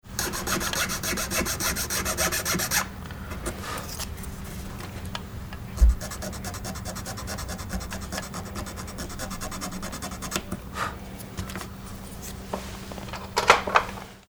craftsmanship

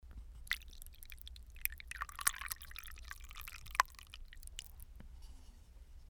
ramen slurp

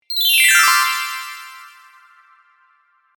kirarin

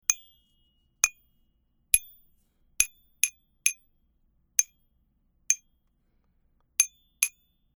bottle hitting

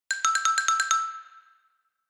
xylophone

